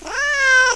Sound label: miaow